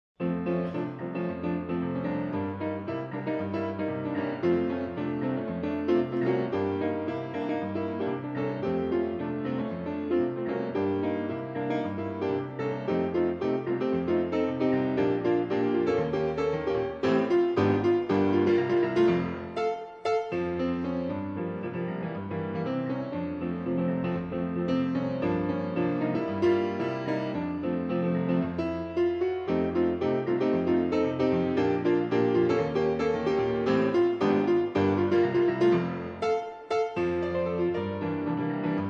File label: Samba, Grand Piano